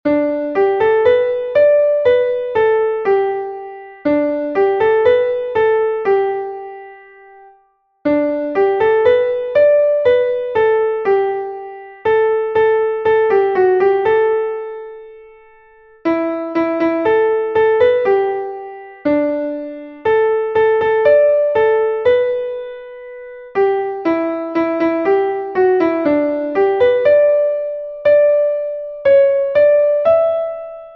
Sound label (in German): klavier; hoch auf dem gelben wagen; melodiemeister